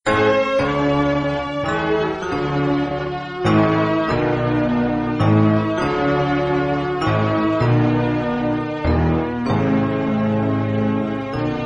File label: Instrumental